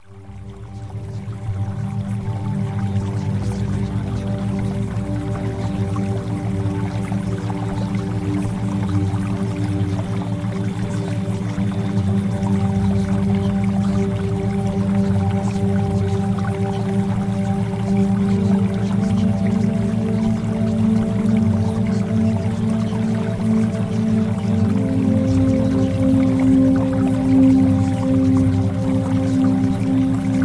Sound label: Music Theta Waves; Premium Subliminals; Breast Enlargement